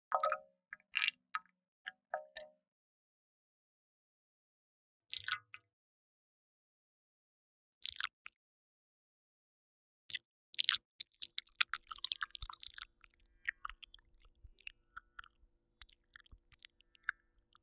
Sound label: Rainchime